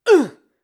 Getting Hit Damage Scream

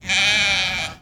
Sheep Bleats

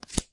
Flip

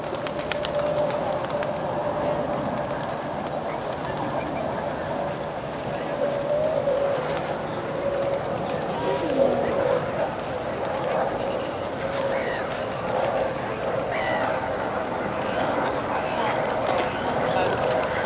Hyde park

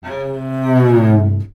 Cello Womp

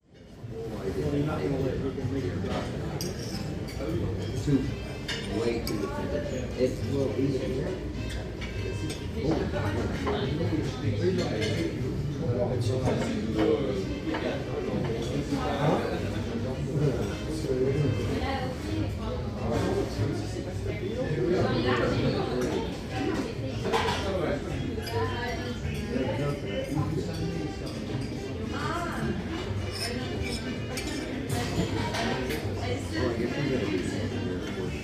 Restaurant in paris

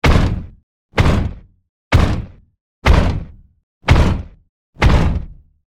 Heavy Footstep; Custom Tfprime; Decepticon; Sound Recreation

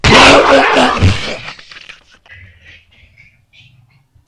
Monster

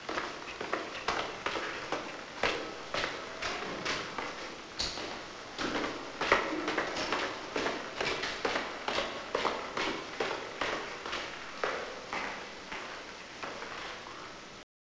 Stairs

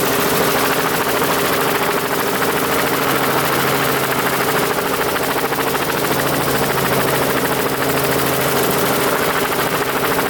Helicopter Blades

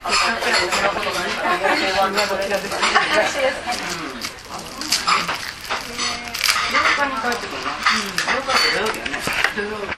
Restaurant

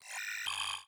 Glitch Sfx

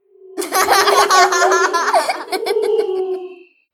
Creppy Kids

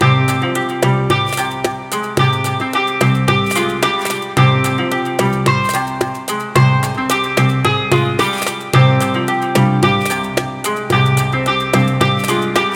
Sufi Dance

